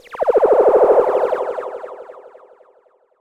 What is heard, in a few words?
Monotron Sci-Fi